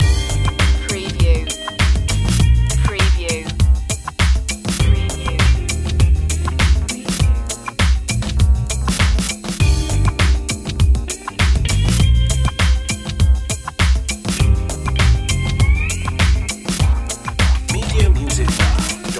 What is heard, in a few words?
loop, funky groovy